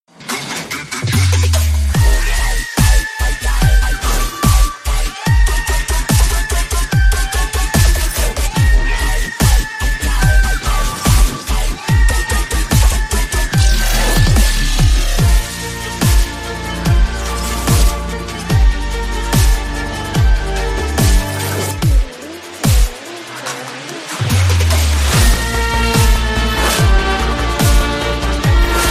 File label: Alone Mass BGM Malayalam